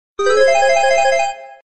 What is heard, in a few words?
electronic